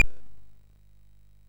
sid MOS6581R2; Magic Serpent

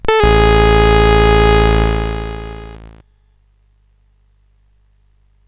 sid CSG8580R5; Rescue on Fractalus

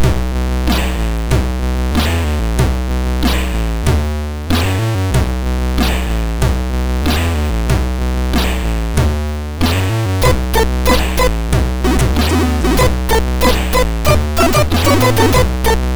First Shower tune,sid MOS6581R2

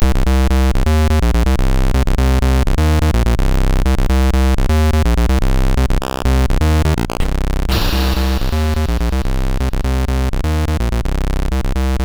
sid MOS6581R3; Dropkick

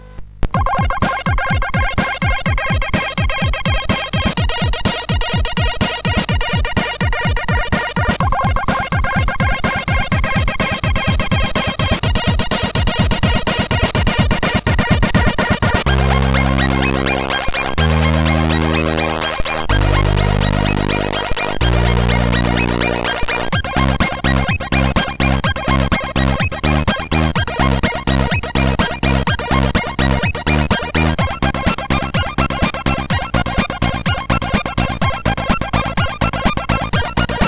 sid, CSG8580R5

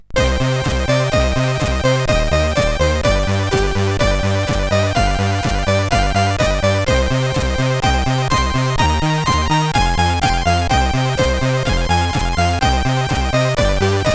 sid, CSG8580R5

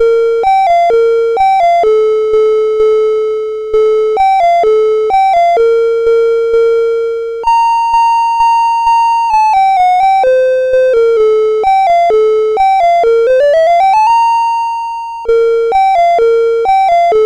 sid CSG8580R5; Rabbit Pie

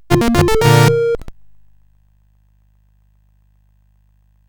sid CSG8580R5; O Rileys Mine

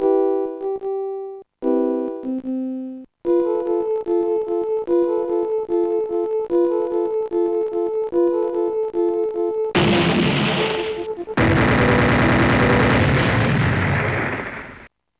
beep beep; Sonix Music Driver

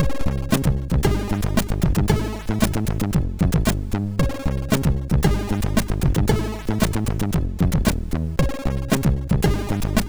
sid, MOS6581R2; PlotMusic; Warnock

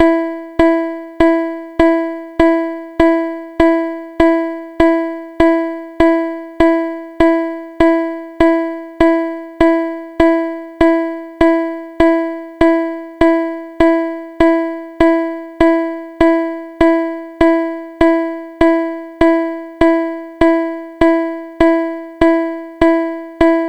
click track; Music-X

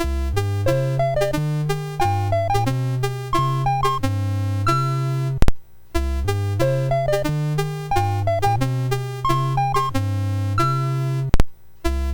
Peg Jump BASIC, MOS6581R3

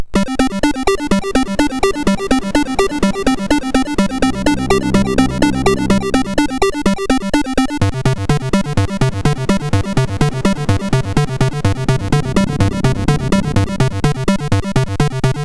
Robot Jet Action, MOS6581R4

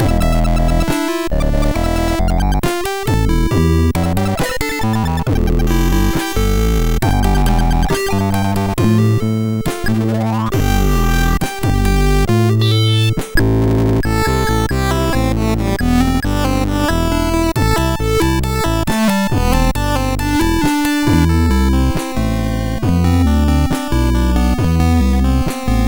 sid, MOS6581R4

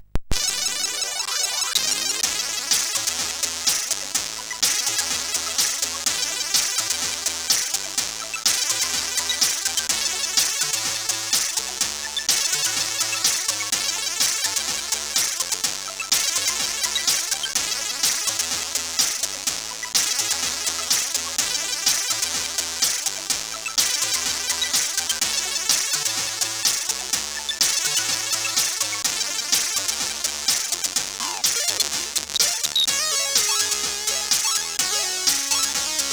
Old Radio, CSG8580R5; Electric Starbounce

